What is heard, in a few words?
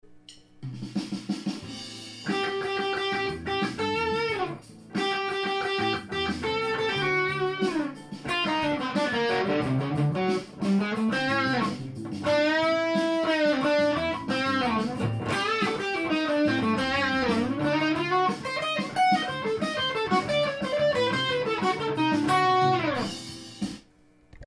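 rock to jazz phrase, guitar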